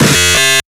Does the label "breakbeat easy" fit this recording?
Yes